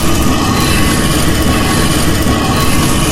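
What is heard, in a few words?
sphere peek ceiling descent loop; Source Engine; Portal 2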